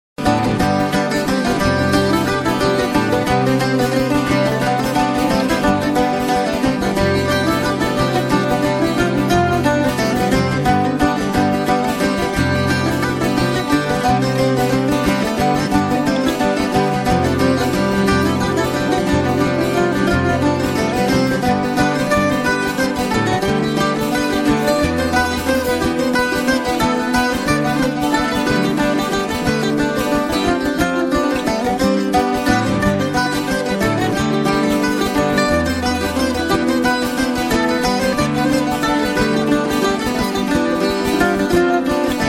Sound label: hornpipe; There and back (Jig); Traditionnel